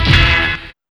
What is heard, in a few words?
STAB; X-Static Goldmine 1